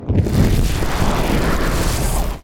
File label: sfx Kill It With Fire; lego ninjago rise of the snakes